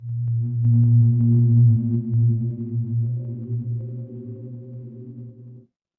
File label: horror drone